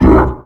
commando punch

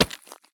sprint gravel; Escape from Tarkov

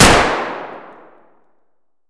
vpo209 fire indoor distant; Escape from Tarkov

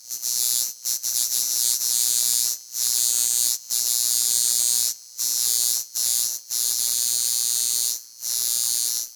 ambience electric buzz fluctuating; Rust

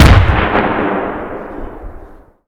Inf Weapons; Red Orchestra 41-45

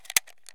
mosin round load; Escape from Tarkov

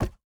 phys impact wood; Rust

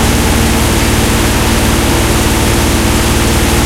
stug40 engine int rpm load, tanks; engines; War Thunder

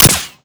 usp45sd, weapons; Modern Warfare

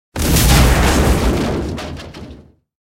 tanks explosion metal; War Thunder

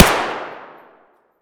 tt fire indoor distant; Escape from Tarkov

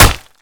Projectile Sounds; Red Orchestra 41-45

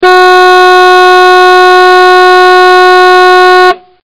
Car Horn; DCS

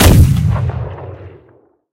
aa fire; guns; War Thunder

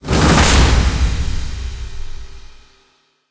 mission complete

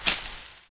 Foot Forest; Civ4 warlords

